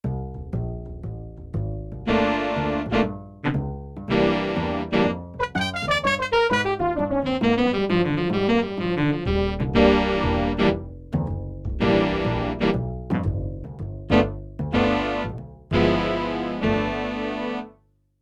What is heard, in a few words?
Jazz Section; Europop Performance 1